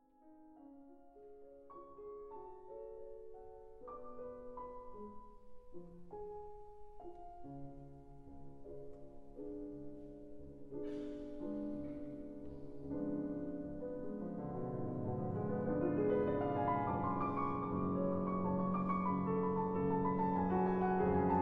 Extrait beethoven sonata for piano no 29 in b flat major; op 106 hammerklavier